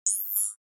ui zoom in

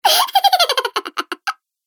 cartoon laugh voice; мультяшный смешной смех различных персонажей

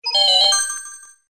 red coin; Звуки из игры Марио

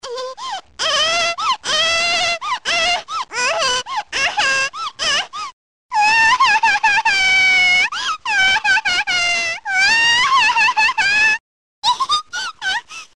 baby cry laugh; baby peach

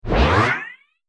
funny magic zoom

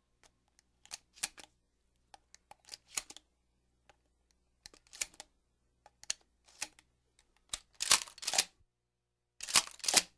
Remington 870

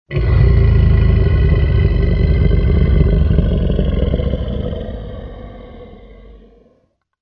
creature snarl; Звуки монстров

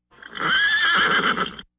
pony natura

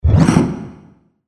scary; Страшные звуки для монтажа

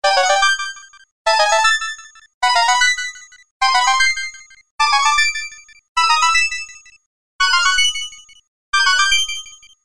red coin; Звуки из игры Марио

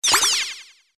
enemy down; Звуки из игры Марио

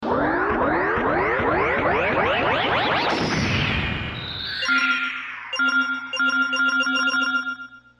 defeat; проигрыш; bowser; Звуки из игры Марио

